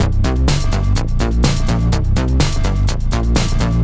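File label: 125 bpm no bass drum, combi loops, nu dance electro; Zero-G; Soundclash; Kaos Theory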